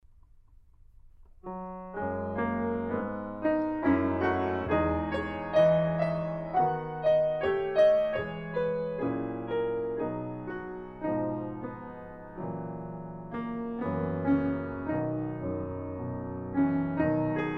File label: alto accompaniment